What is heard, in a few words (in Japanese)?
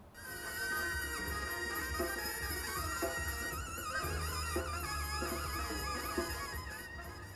音楽エアトースあり